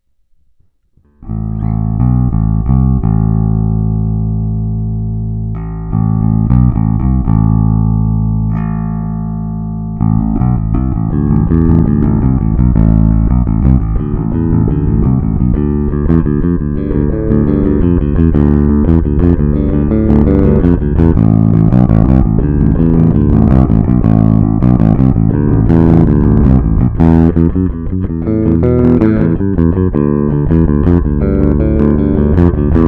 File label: bass tune